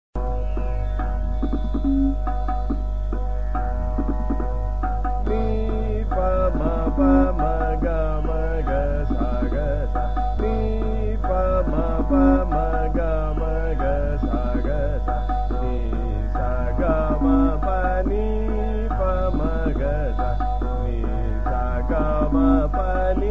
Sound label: Sargam Ni Pa Ma Pa Ma Ga Ma Ga Sa Ga Sa Ektal Drut, Jog